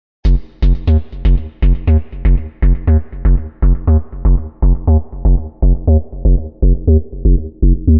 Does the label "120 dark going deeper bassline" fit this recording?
Yes